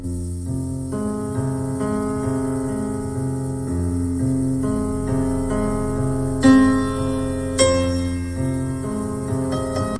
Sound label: Piano